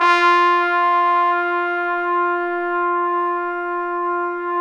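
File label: Bone Sec, trombone section; Roland L-CD702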